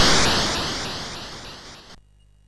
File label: sid MOS6581R2; Gryzor; hvsc